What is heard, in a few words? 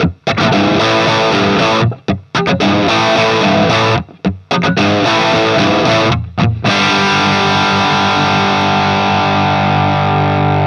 tele plexi cranked